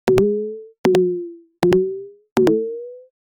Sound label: game jumping sound cartoon; cartoony